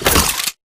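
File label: Tick Movement Footstep Jump; Titanfall 2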